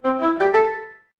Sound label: ui alert